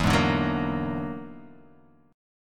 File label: E 9th Flat 5th E down